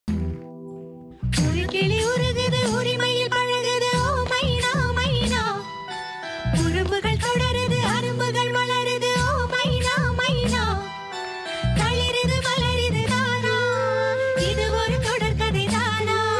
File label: tamil song